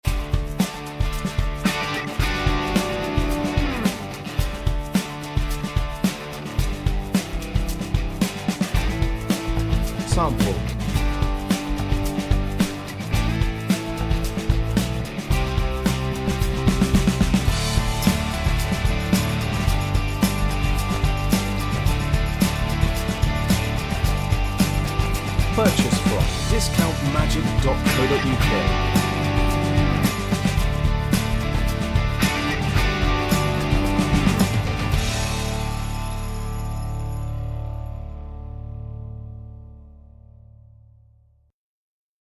beat

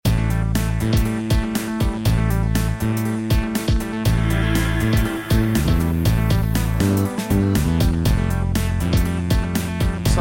intense

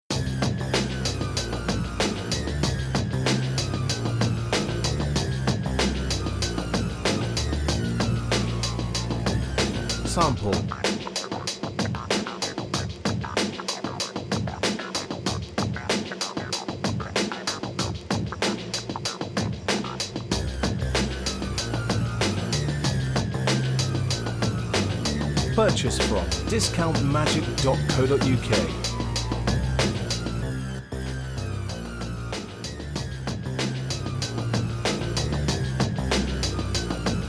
electronica

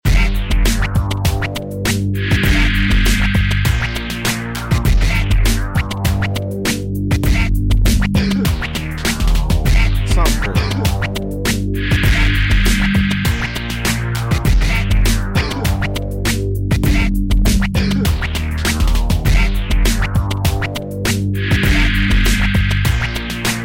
hip hop

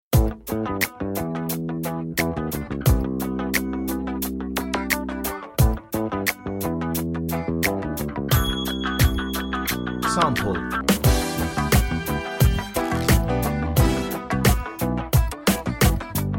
beat

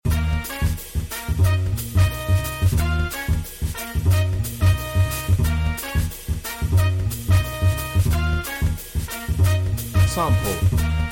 horn jazz remix

